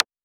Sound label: Woodblock; LIMAT Div Dept; Drums